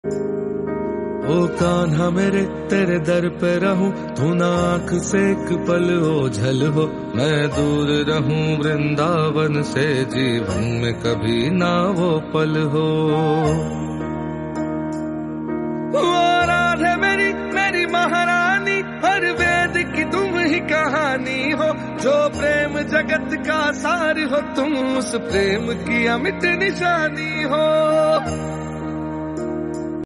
devotional hindi

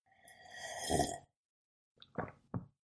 water drinking sound effect

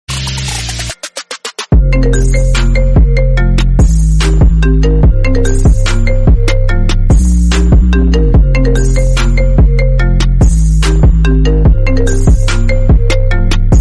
apple remix version iphone

